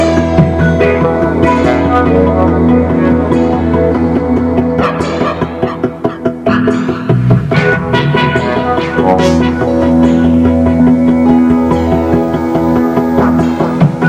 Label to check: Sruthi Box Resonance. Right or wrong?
Right